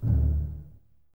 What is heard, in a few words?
BSS D 1 Pizz, String Ensembles Basses; Miroslav Vitous